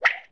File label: whip